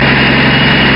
Dm11 run; finnish

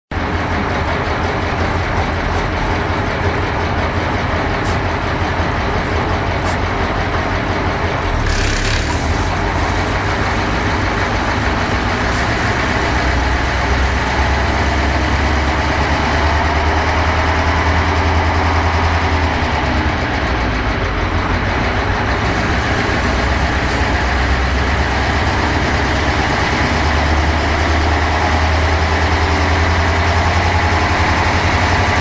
Finnish railcar Dm7 accelerating

